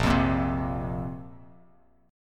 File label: Bb Suspended 2nd Bb down Bass Standard; strummed